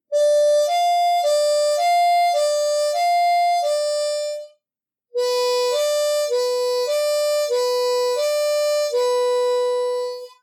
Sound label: Basic 4 5 and 3 4 movement